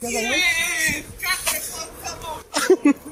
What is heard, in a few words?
jeej